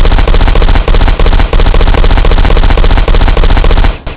shoot turrent; cstrike; deathrun taringacs portal

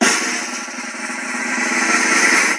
drumroll; cstrike

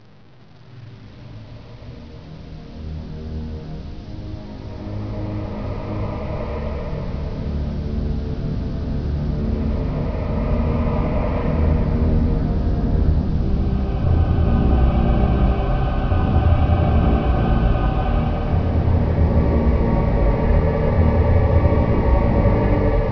ambience; cstrike; midwich

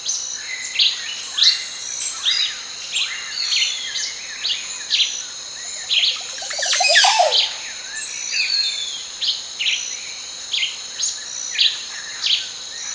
jungle amb; cstrike

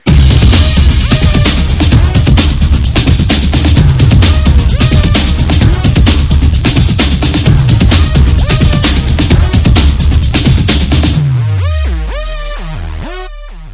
rave; cstrike

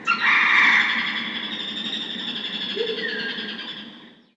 jungle call; kjungle; cstrike

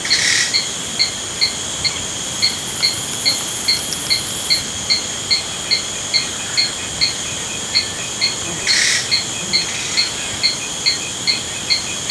jungle amb; cstrike

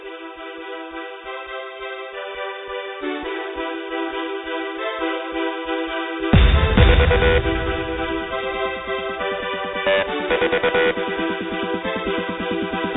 music, nightclub; cstrike; ka nightclub

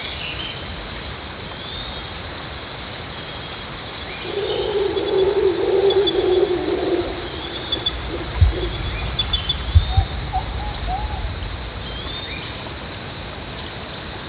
jungle amb; kjungle; cstrike

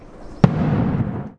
boom; cstrike

